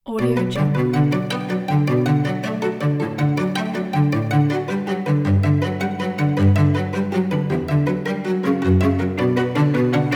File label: viola cello